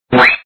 present out; magic heaven

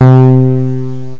Bass, instrument; Easyplay

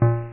tone; Oids 1.3

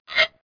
scrape; Microsoft Graphics Studio Greetings; Microsoft 1998

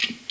Switch; iPoker 2000

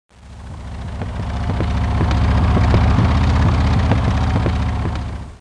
ambient car; InventorLabs - Transportation

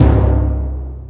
menu; Messiah; INTERFACE

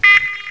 beep; Connections

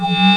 spell Shield; CHECKERMANIA 1.04

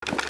shotgun reload start, weapons foley; Spearhead Demo; MacFormat 2003 June

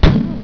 Killed By Wand; ClockWerx Demo

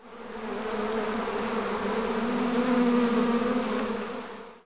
bees; The Sum of All Fears

